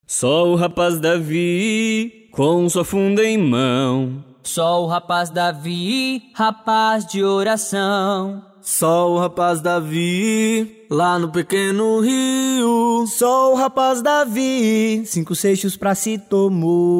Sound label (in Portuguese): quarteto vocal